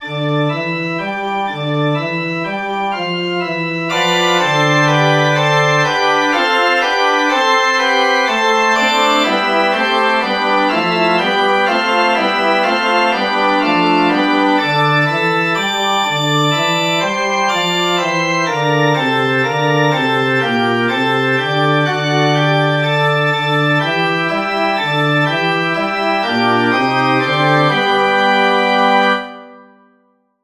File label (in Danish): forspil